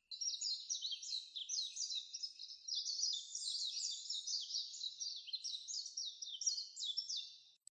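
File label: mejiro song, Japanese white-eye; saezuri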